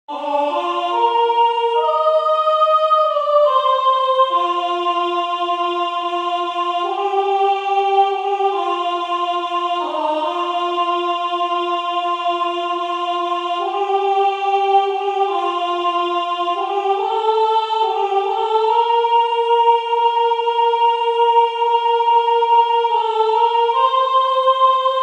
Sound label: AN IRISH BLESSING Soprano